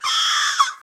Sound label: a crow cawing; bird